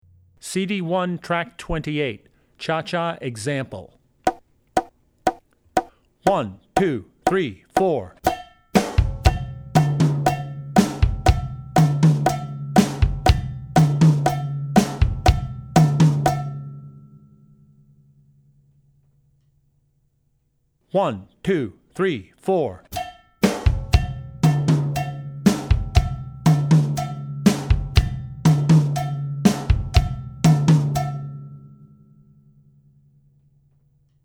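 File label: Cha Cha, Drum Tracks; Drummer's Bible Tracks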